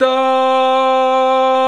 VOX, Male Scats

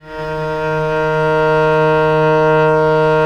VL D#2, VIOLE, Historical Instruments; USB Soundscan vol.23

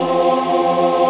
VOICEPAD; Best Service; XX Large Pads; Sample CDs

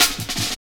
Hard Stepz Cut, Jungle, Drum Loops; 165.5; Zero-G Jungle Warfare 1, 2 & 3; 90 s Sample CDs

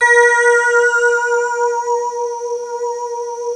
PPG WAVE, OLD SYNTHS

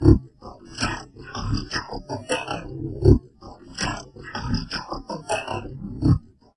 VOCOD, Drum Loops Crazy Processed

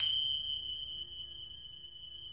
TRIANGLE; AKAI S6000 CD-ROM - Volume 1; PERCUSSION

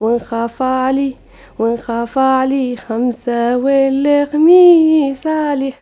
YOUNGIRL, Arabian Traditions

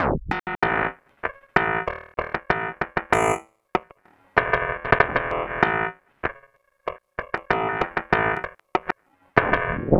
El Distorto, Basses; Sonic Foundry (Sony Creative Software); Modular Electronica

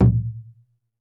PUEBLO, American Natives, Earth Tones; E-MU Formula 4000 Series Vol. 4

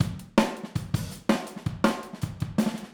Overhead, Drums, 162 BPM; Drums from the Big Room The Mixes; Take Your Toms Off; Verse

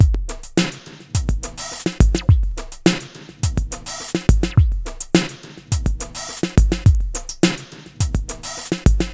xtra Euro gruv; Sonic Foundry (Sony Creative Software); Ugly Remnants VOL-2

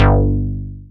bass, synths

